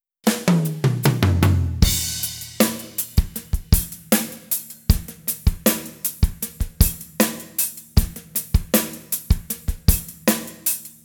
rh drm intro, Rock Heaven Drums, 078bpm; Quazibeat

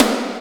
TOM, Drum Dry; East Collexion; Drum 1

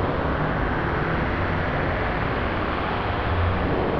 sparse soundscape samples; ReLooped

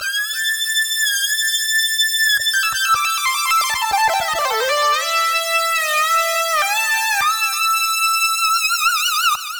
100 A Phr Saturn Lead; Zero-G; Future Wave

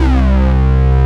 OBERHEIMS

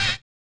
collision; Spectrasonics; Metamorphosis

